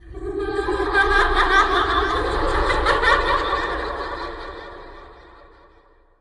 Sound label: pipe laughter